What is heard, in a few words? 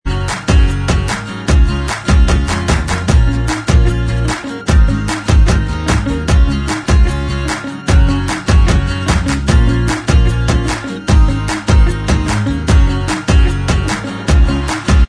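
instrumental track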